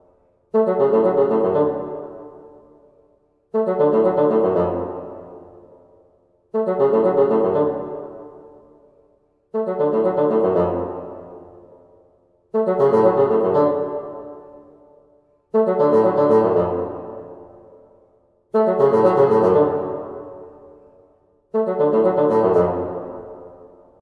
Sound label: bassoon; звук для саундтрека фагот